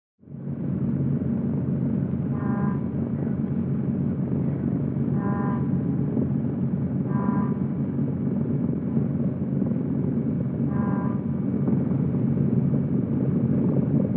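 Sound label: Prop DC3 Interior Idle Taxi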